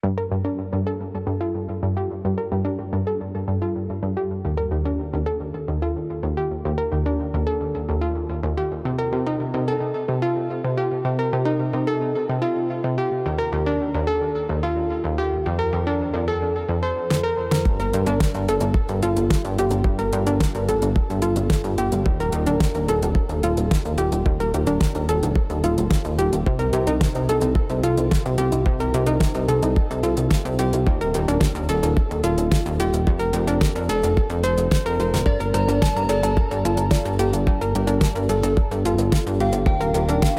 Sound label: Electronic Impulse